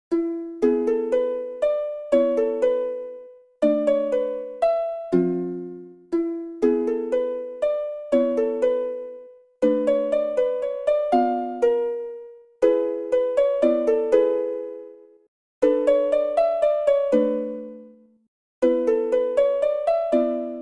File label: Awful anthem